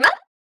fill er up; HeavenStudio; fillbots

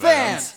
fans; HeavenStudio; ringside

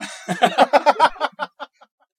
laughter; HeavenStudio; tapTroupe

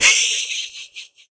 laugh; HeavenStudio; catchOfTheDay; pausegill

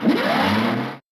big move; HeavenStudio; fillbots

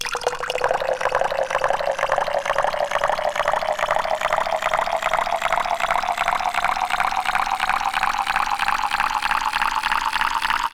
water; HeavenStudio; fillbots

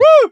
woo; HeavenStudio; tap Troupe

